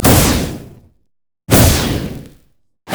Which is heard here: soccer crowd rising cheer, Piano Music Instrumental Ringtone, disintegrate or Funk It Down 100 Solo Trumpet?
disintegrate